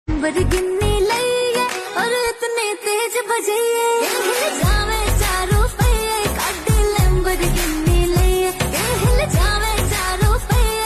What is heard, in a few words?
Ringtone